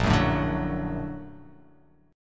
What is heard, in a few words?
Bb Augmented Major 7th Bb; Bb+M7 strummed; JGuitar